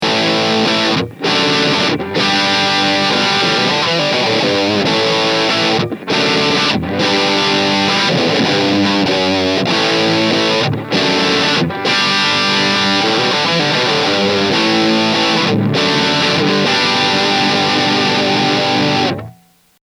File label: SM57 on axis; Bogner; Captor X